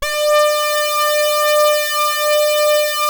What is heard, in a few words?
SAW RES WET, SYNTH LAYER, Synth and Organ; InVision Interactive; Keith Emerson